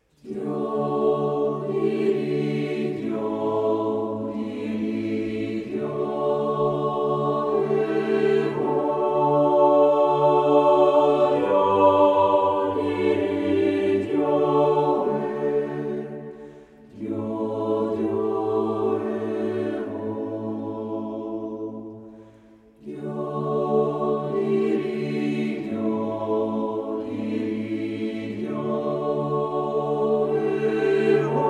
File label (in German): Adventjodler